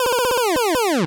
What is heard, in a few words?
8bit se